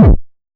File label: Heavy Synth Kick; Zero-G; Soundclash